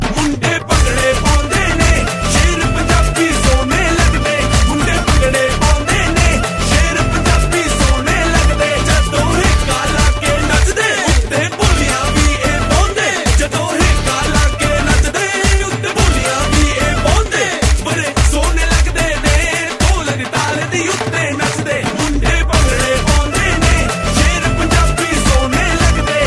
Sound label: Punjabi